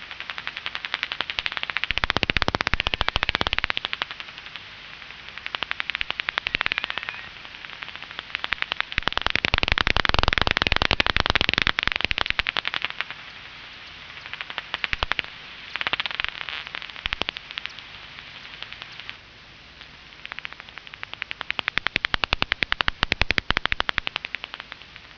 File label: brandts, bats